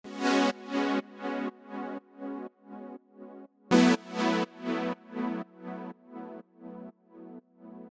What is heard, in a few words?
Pad; PatchFactory